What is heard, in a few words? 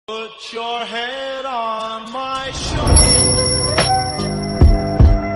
remix; kakashi